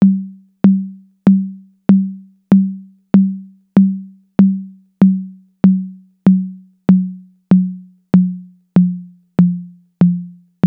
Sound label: TR-808 Low Conga; Roland; Instrument samples